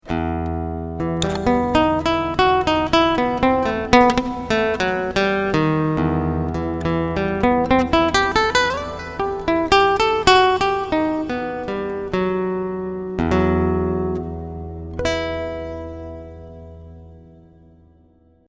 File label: classical lick